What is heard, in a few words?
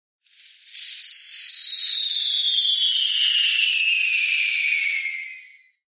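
Falling Bomb Whistle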